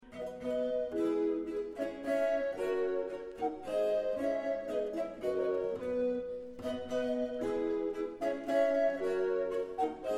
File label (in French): hymen seul, opéra, trio : soprano; atys